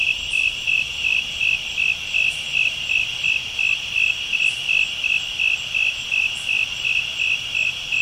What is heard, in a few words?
fultoni